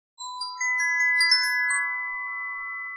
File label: denoising glock 10dB mix